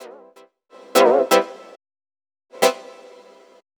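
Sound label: synth, Roots Funk; USB Soundscan vol.41; AKAI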